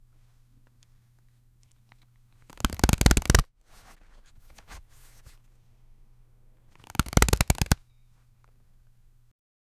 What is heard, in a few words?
shuffle card